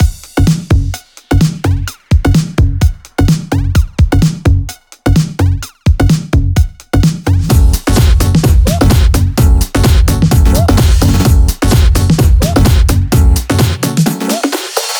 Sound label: 128BPM Radiate Mix